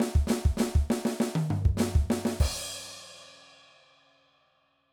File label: Punk Fill, drum